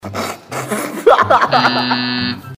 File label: stifled laugh